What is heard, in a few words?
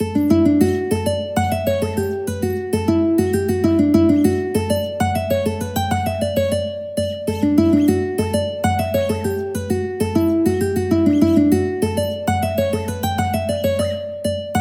mandolin